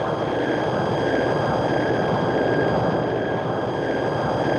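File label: atmosphere; Zero G; Man Machine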